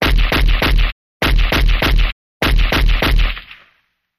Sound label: Ak47 Multiple Bursts